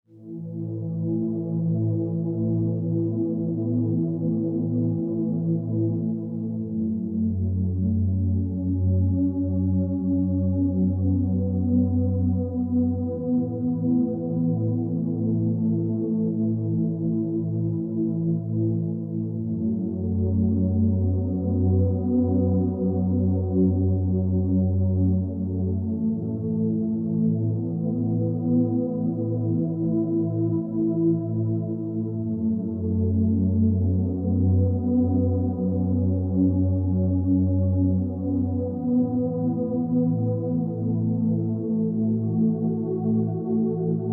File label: Rainy Cat Game BGM